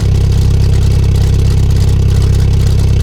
Eleanor Idle; car simulator 2

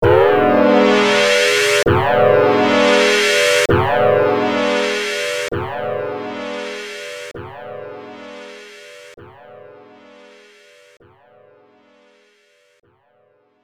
Alert; Blazeon; arcade

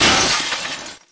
light destroyed; Angry Birds Seasons

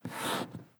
choice slide appear

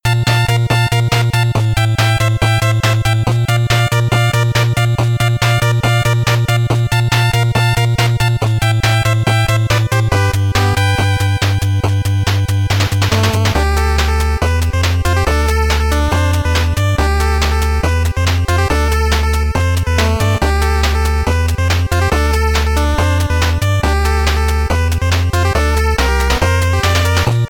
BGM 8bit; ExMode; Mighty No. 9; 8-bit soundtrack

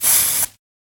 Spray

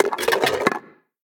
Cans Jump Scare; The Inn

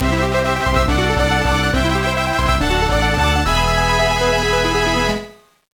Unknown Jingle; Golden Sun: The Lost Age

